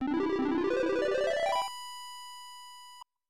Get Key!; Yuujin no Furi Furi Girls; snes

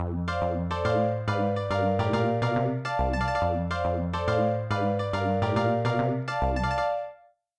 Garage Rock

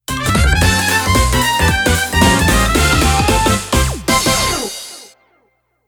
Goal; Hanaby's Summer Festival Original Soundtrack

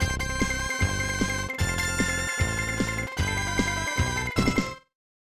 Unused Jingle; Kiteretsu Daihyakka Choujikuu Sugoroku; SNES

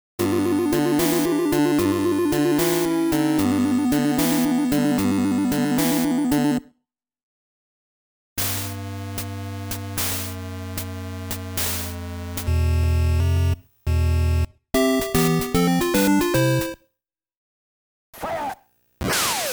Game A Start ~ Jingle ~ FOUL!! ~ FIRE!! ~ YOU WON; Game Sound Museum Famicom; Kousenshou Series; Wild Gunman; Duck Hunt; Hogan's Alley

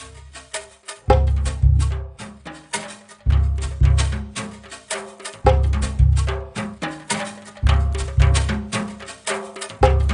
Jungle Level

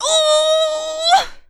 Female Mad Scream; Lucius II The Prophecy

